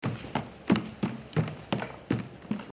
dash

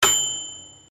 cooking stove

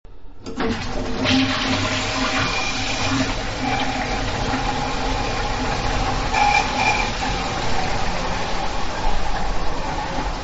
restroom

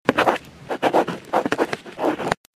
pen

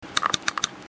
mouse

